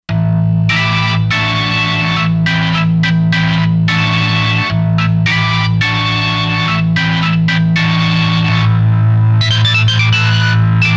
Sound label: Purple Organ, Roland Fantom XR